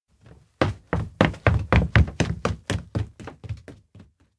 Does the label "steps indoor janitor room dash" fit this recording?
Yes